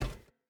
footstep burlap metal run; Rust

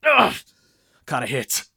hurt medium; Escape from Tarkov

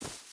footstep boots grass run; Rust

